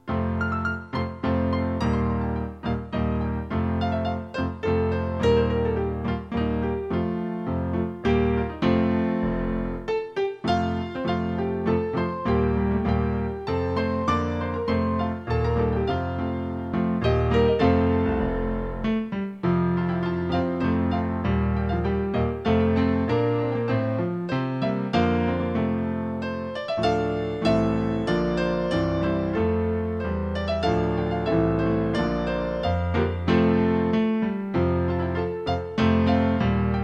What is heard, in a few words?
duet